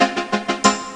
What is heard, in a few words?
Witch; AMUG Show